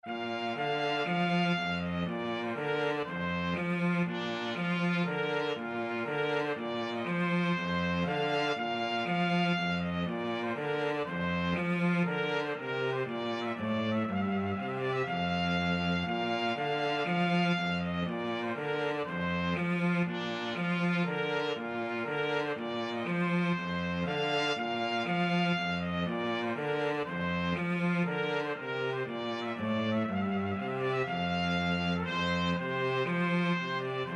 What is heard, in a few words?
trumpet tune, trumpet cello; trumpet-cello duet; clarke